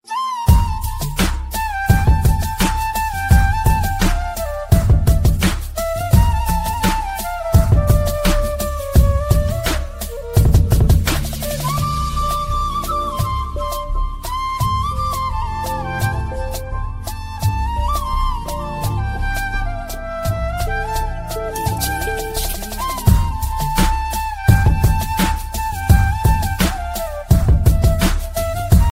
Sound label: flute, ringtone; mersal; nokia